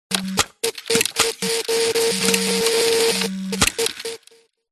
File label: science fiction steam punk machine operate clicks clunks beeps; audio mangler